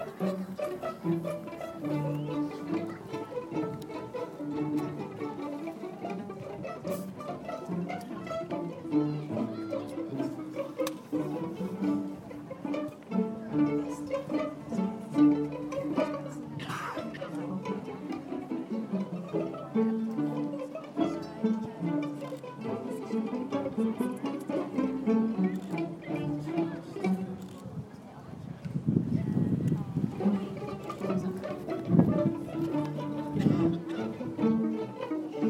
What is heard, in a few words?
youth string orchestra